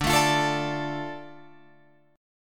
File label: D Minor D Guitar Open F; strummed; 14,16,17,16,17,16